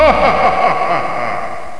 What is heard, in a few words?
WOLF